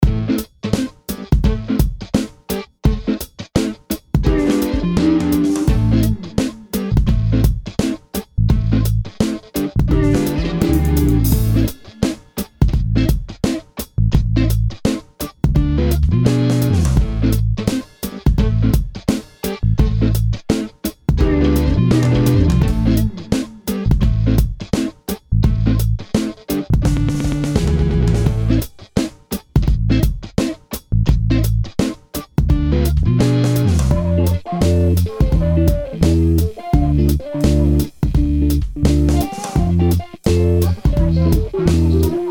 bacon beat

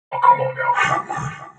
Vocal Studies

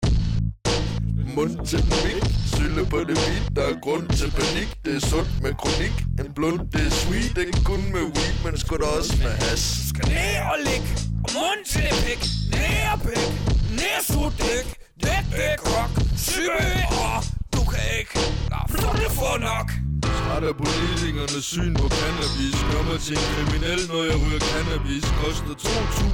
hiphop

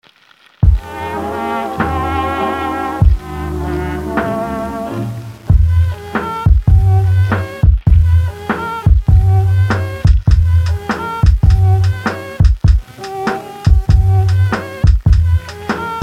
hangout beat

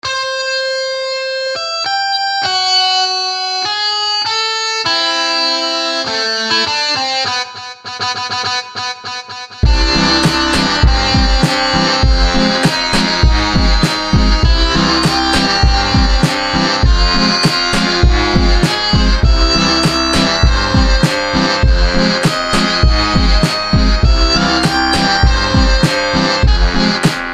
beat

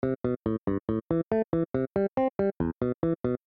the melody 140bpm g-am-c-em

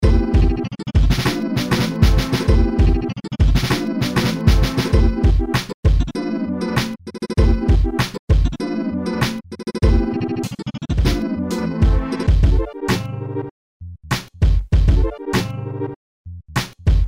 Electrodelic

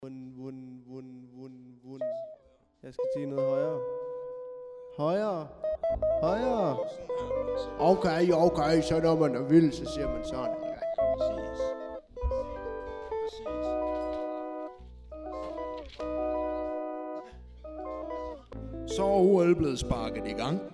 burger hiphop

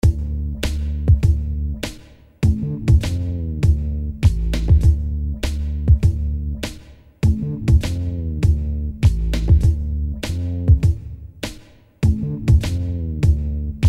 A beat

